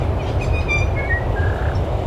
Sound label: tui song chathams